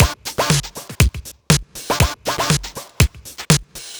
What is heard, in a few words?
Has Guitar Will Travel, Drum Loops, 120 BPM; Sonic Foundry (Sony Creative Software); Underground UK House & Electro